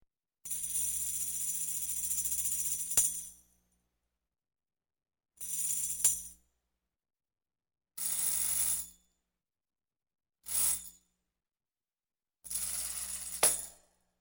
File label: Tambourine Steady Shake; Electa Quinney